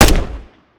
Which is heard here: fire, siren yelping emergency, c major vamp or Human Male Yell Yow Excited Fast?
fire